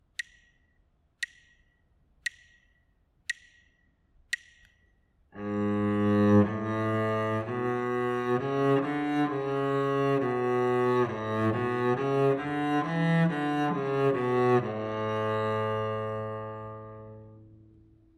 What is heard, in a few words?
partially completed melodic dictation